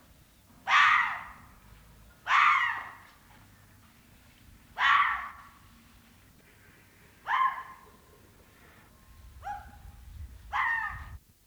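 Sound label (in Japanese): kitsune, ホンドギツネ 鳴き声; 単独で行動することが多いキツネにも、発情期にメスを呼ぶ声、なわばり宣言、危険通知、餌の到着通知など、さまざまな音声によるコミュニケーションや行動の型が観察されています。; 上野動物園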